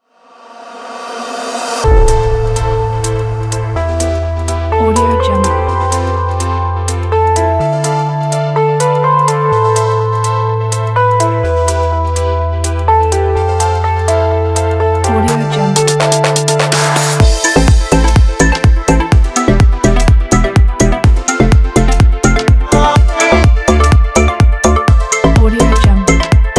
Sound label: the deep house background promo